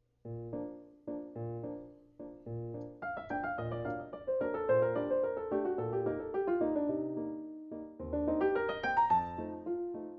Allegretto-full tempo with solo, Flute; Goddard

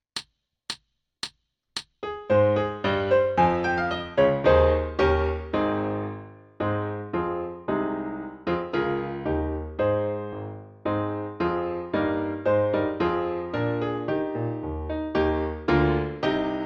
Full Tempo Accomp Only; Solo Accompaniments; Trumpet; Fitzgerald; English Suite No 1

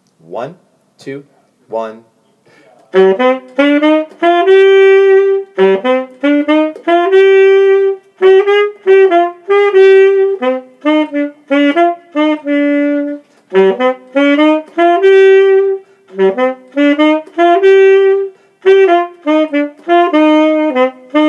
Bari Sax; Solo Accompaniments

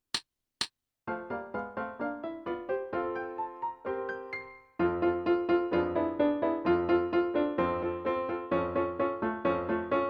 Scherzo Slow Tempo with solo, F Horn; Shelukov

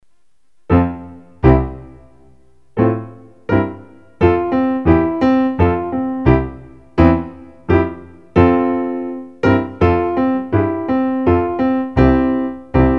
Fanfare, Trumpet; Solo Accompaniments; Telemann

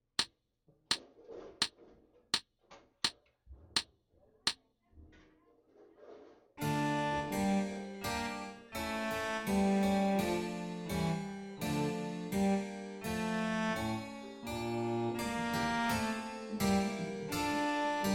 Andante Full Tempo Accomp Only; Handel-Andante and Bourree

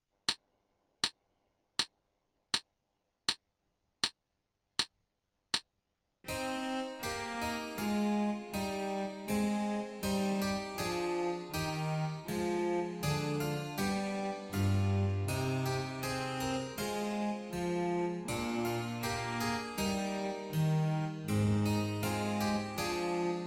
Adagio Full Tempo Accomp Only, Oboe; Handel - Sonata No. 1

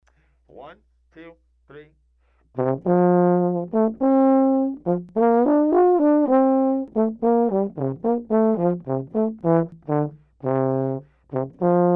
The Jolly Peasant - Tuba Solo Only

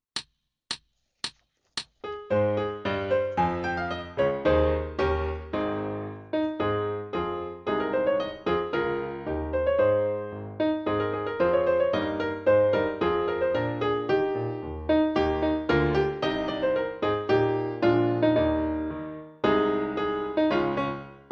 Full Tempo with Solo, Trumpet; Solo Accompaniments; Fitzgerald; English Suite No 1

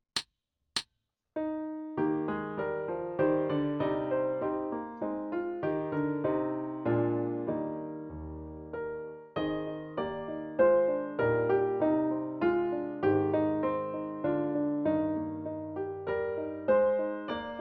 Full Tempo with Solo, Trumpet; Solo Accompaniments; Fitzgerald

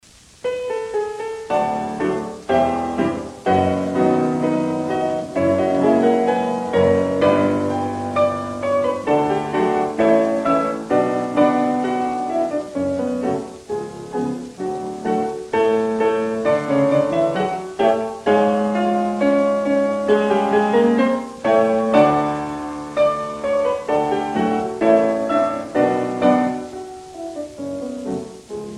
Gavotta Piano Accomp with Solo, Flute; Solo Accompaniments; Gavotta From Classical Symphony

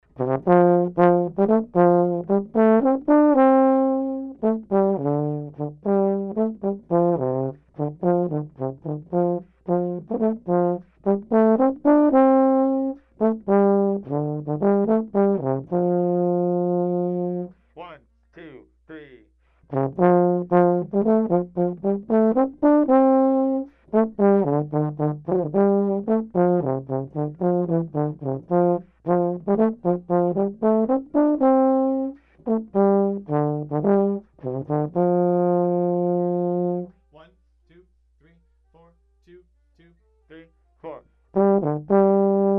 Trombone Solo Only; Solo Accompaniments; Three Miniatures No. 1

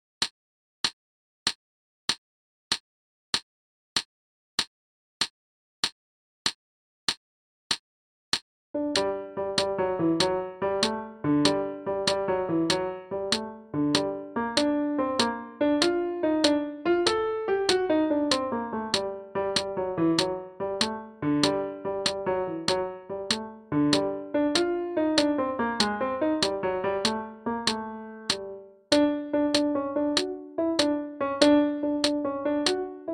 Clarinet Solo Only; Solo Accompaniments; March of the Marionette

